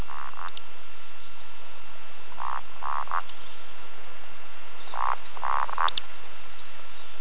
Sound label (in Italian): beccaccia